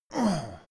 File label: male hit soft